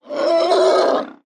con lon khong vui

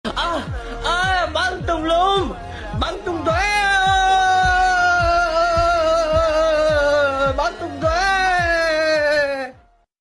ban tum lum ban tung toe

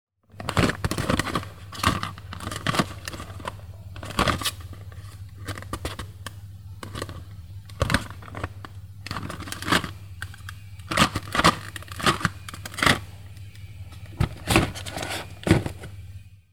tieng mo hop lat tung xao tron do dac

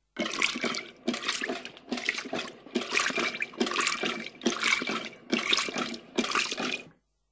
am thanh cua mot con bo vat sua

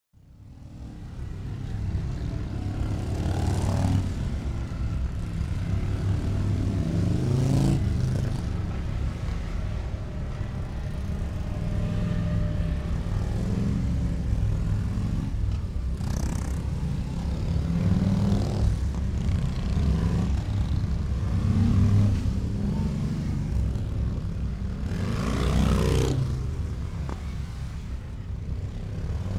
tieng rat nhieu xe may chay tren duong

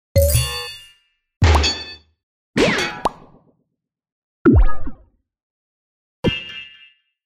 tong hop am thanh nhan tien thuong gianh chien thang

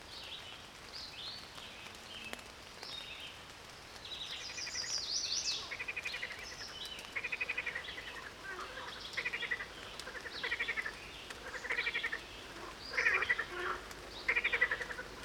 tieng ech keu vao buoi sang trong thien nhien

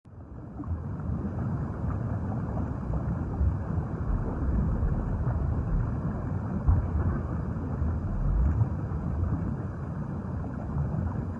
tieng nuoc ngam chay duoi long dat

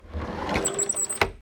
dong mo ngan keo cu ky

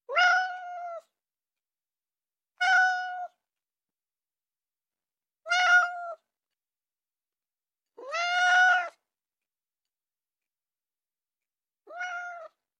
am thanh cua mot con meo binh tinh binh thuong dang keu meo meo

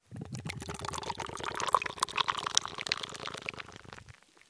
tieng rot nuoc chay oc oc oc

